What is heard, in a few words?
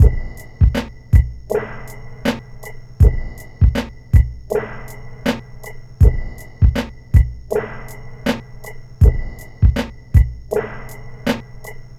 Subtle Loop; Sonic Foundry (Sony Creative Software); Esoterik Beatz